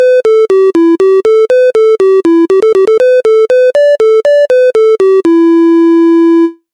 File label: pentatonic morning